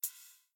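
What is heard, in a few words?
rabbit footstep; smite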